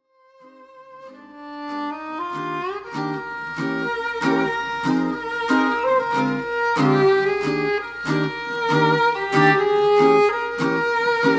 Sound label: Classical Ensemble